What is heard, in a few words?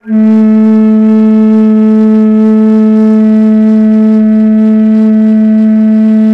BASS BONS, India Winds; E-MU Producer Series Vol. 7 – Old World Instruments